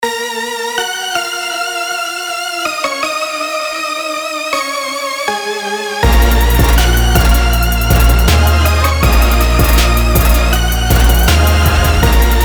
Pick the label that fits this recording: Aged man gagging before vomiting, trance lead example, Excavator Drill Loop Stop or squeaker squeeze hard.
trance lead example